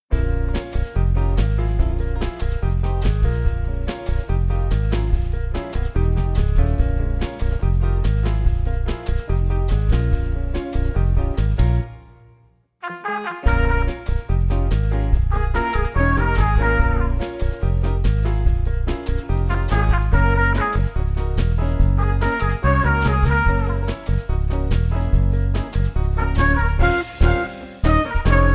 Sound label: Jazz; Jazz Inc (Professional)